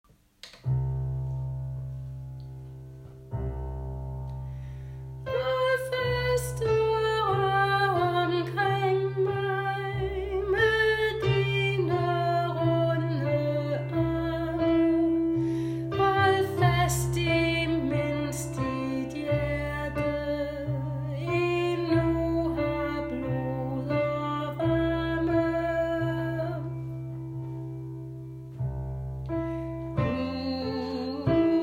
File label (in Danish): aftenstunden angst alt